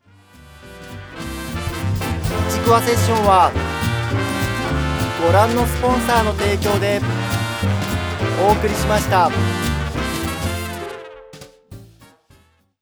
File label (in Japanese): ジングル; ichiquewa CD vol3